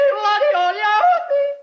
yodel goofy

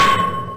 crowbar clang; half-life

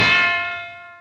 brake drum clang

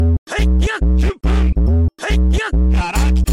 free funk sample but with beat

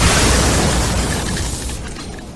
black flash sfx

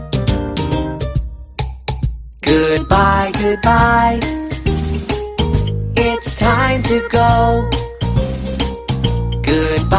goodbye song

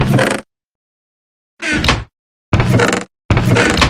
chest sound effect; minecraft

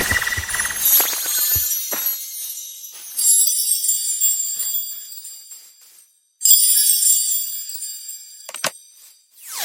shiny pokemon sound

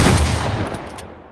pump shotgun; fortnite

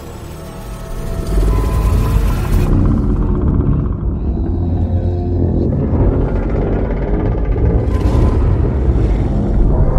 roar; gojira alpha

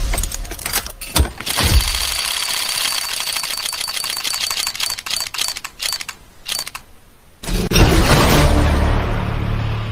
csgo case open

